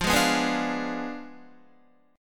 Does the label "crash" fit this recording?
No